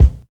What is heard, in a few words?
original bass drum c key